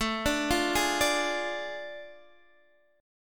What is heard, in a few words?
A Major 7th Suspended 4th Sharp 5th, Guitar, Open D